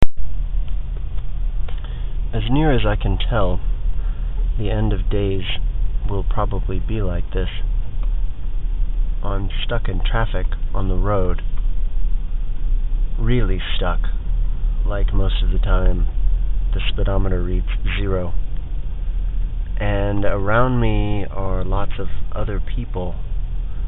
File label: traffic